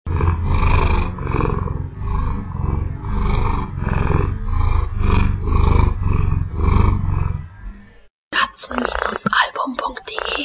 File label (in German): kater schnurrt; katzen-album